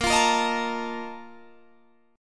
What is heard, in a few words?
Bb Major 7th Suspended 4th, Guitar, Open G; BbM7sus4 strummed